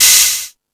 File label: Found Sound, Drum Machines